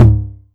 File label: tom mid, Novation Drumstation